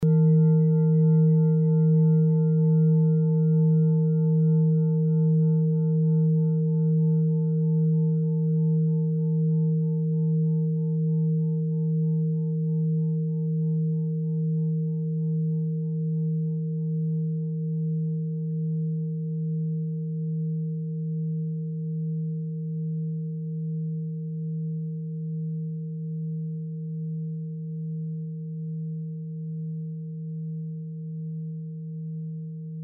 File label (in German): klangschale tibet